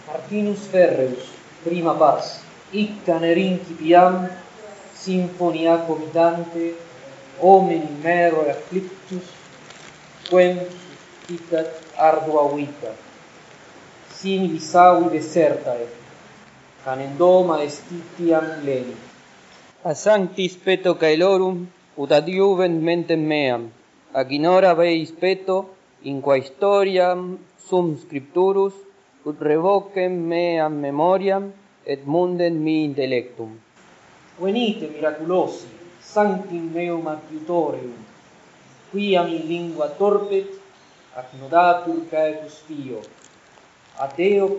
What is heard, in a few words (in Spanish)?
Primer canto en latín, Latin